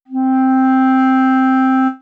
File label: c clarinet